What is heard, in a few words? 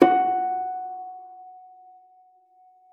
FLAG F5, harp; Xsample VOL-8; HARP+FX